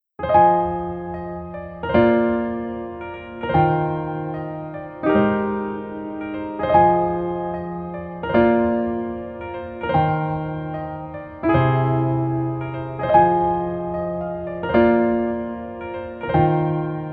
Piano ver, 멜로디MR, 여자키

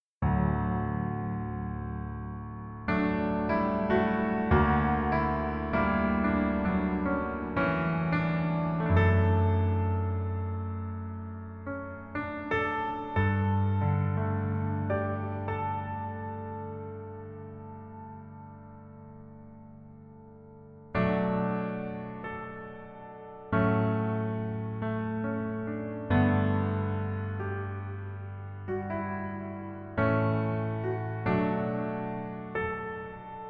Piano ver, 멜로디MR, -1키

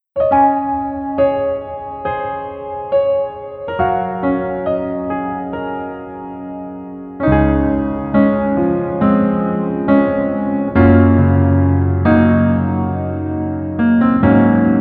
Piano ver 멜로디MR

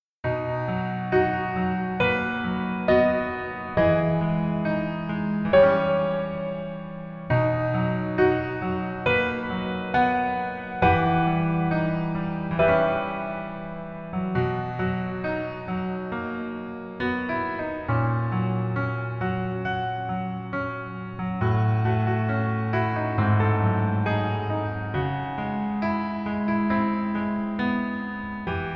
Piano ver 멜로디MR

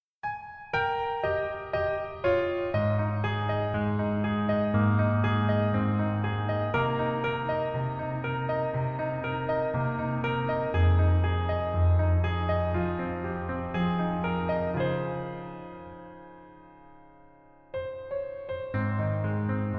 Piano ver, 멜로디MR; 더킹, 영원의 군주

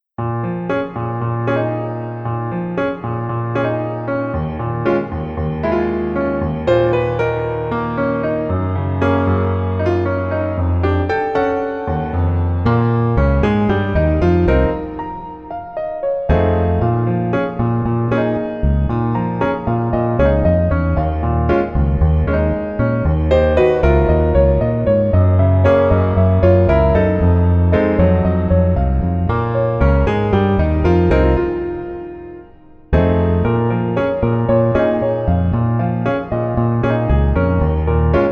Piano ver 멜로디MR

